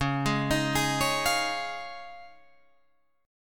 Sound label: Db Major Add 9th, Guitar, Drop D